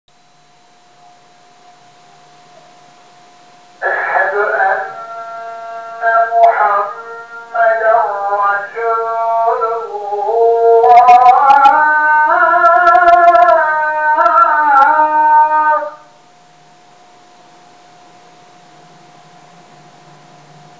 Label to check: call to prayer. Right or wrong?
Right